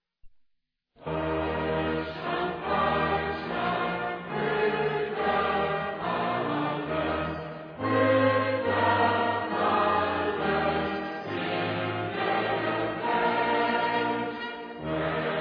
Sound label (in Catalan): himne alemanya curt